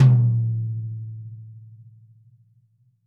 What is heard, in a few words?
DW Red Maple Tom Room; 2002 DW Red Maple Kit; One-Shots; Sonic Foundry (Sony Creative Software); Vital Drums